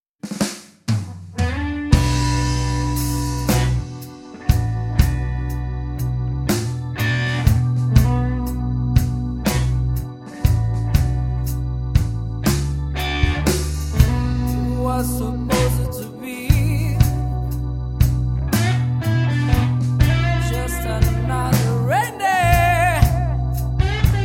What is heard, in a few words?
blues